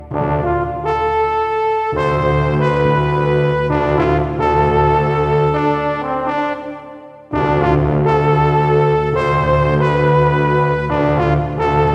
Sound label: Loop Brasses